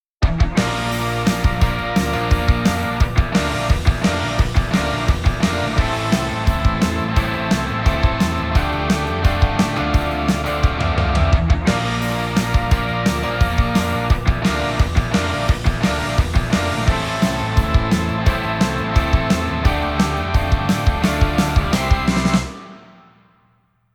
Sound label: Drums Off